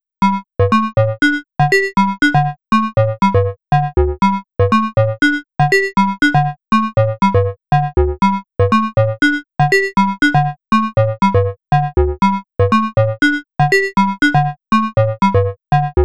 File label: ring modulator